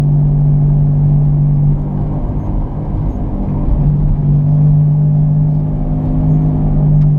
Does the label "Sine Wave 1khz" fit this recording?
No